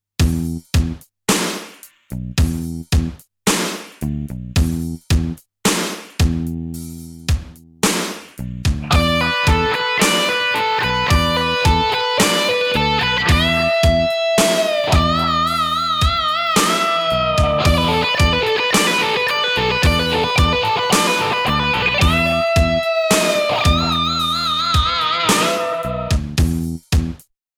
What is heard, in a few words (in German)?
6er Gruppierung basic